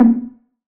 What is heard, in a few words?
Temple Drum Vinyl, One Shots, Drums, Misc Percussion